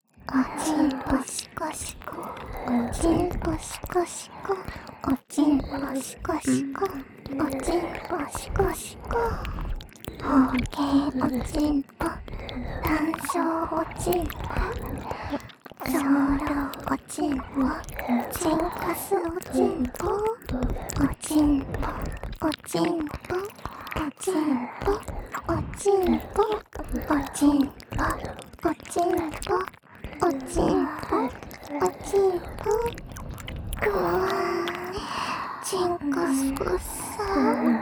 両耳舐め; おまけループ（オナサポ）; メスガキるびぃに馬鹿にされたい！

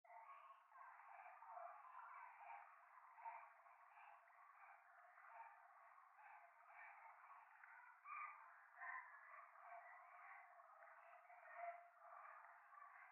夢の中 可愛い喘ぎ 川のせせらぎ

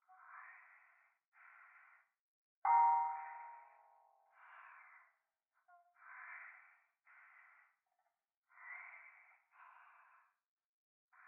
トイレ 右耳 密着 吐息 寺

